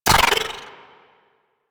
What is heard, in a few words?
Sniper Rifle Layer; Wraith; Grineer